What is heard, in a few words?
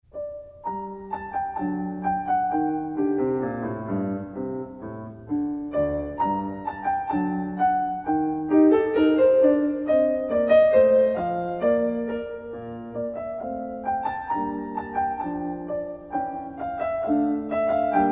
Aire in g minor; Marlais Book 5